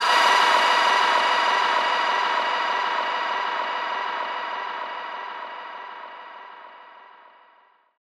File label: Impact